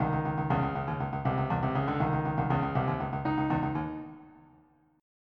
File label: funk; Millennium Gold 2000